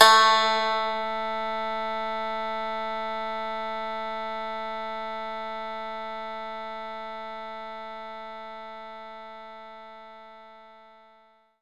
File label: Koto A3